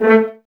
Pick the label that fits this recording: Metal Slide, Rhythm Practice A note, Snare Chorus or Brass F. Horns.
Brass F. Horns